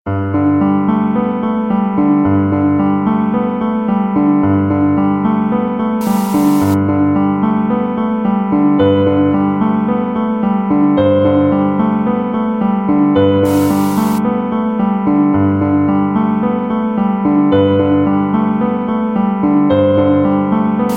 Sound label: Piano Music